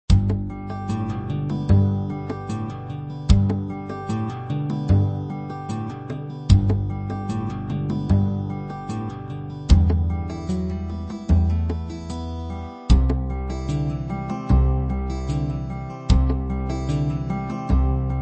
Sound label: music